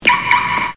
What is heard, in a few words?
loose, sfx; BALLY